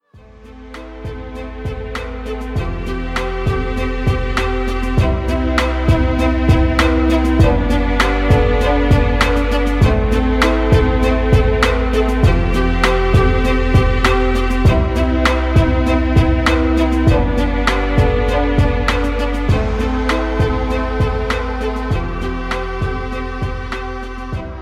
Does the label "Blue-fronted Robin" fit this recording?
No